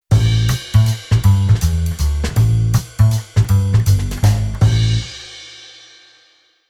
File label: Bass Arr Jazz